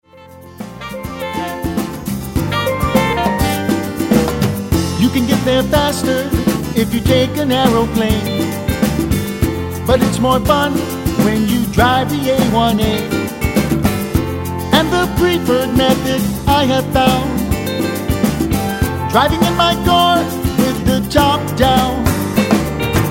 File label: Tropical Jazz